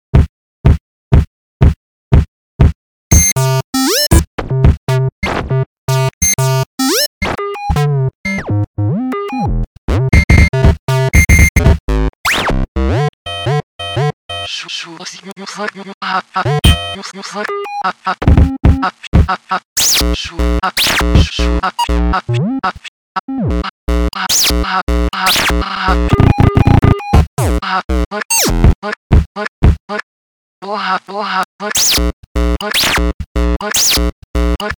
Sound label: negative beat, bugcore